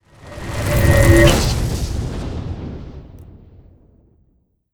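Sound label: spell destruction cast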